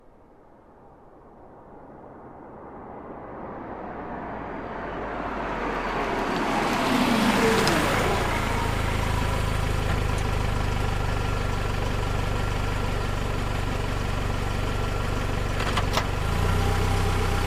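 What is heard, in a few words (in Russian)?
priblijenie avto, approaching car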